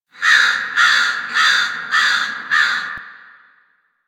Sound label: Fall Crows; Warframe; Ambience; Nineteen Ninety Nine; DE